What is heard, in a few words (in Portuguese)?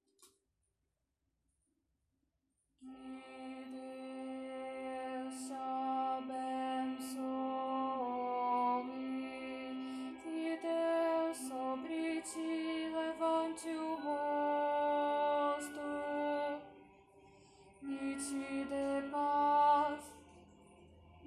contralto; bencao aaraonica